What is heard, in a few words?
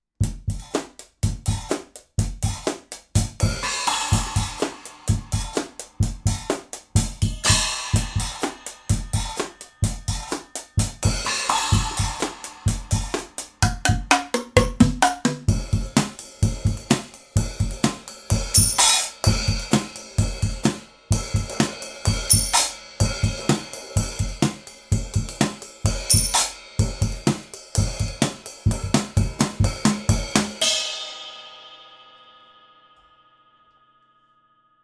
Drums SE300B